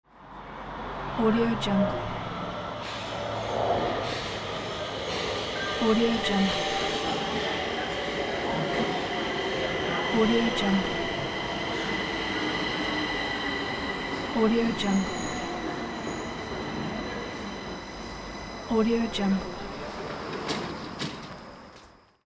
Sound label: city train arriving